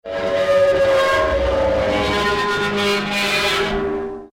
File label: destructive metal scrapes